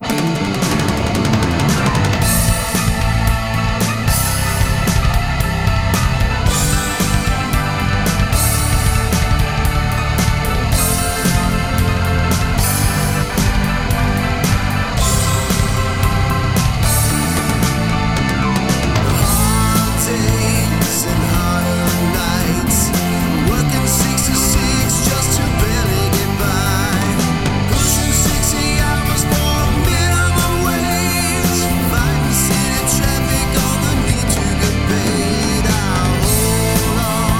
harmony karaoke; harmony karaoke full mix

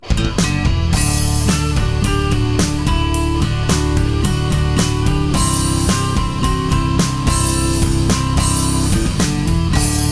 electric; music smorgasbord

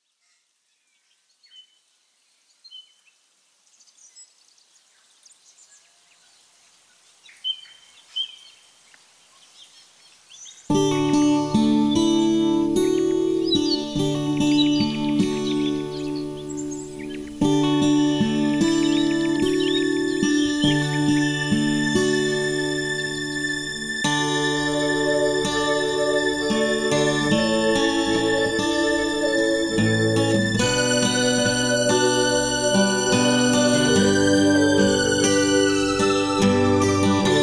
music ambiance melody full mix

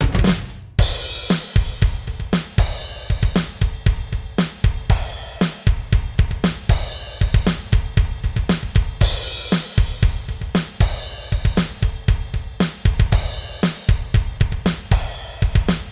vocals lead; music smorgasbord

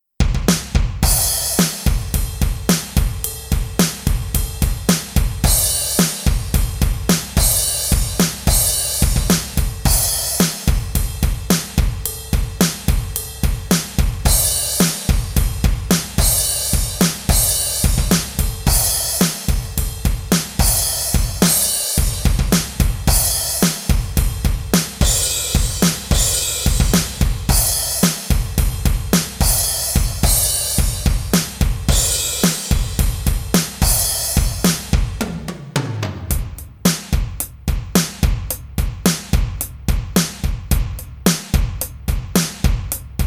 drums; music smorgasbord

